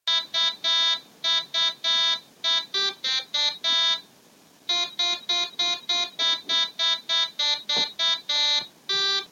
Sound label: jingle bells; after coding we need to produce the sound ... using the appropriate speaker is important